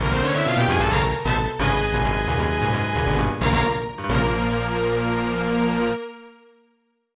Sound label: Level Cleared; english of the dead ds gamerip 2008; Level Cleared -Alternative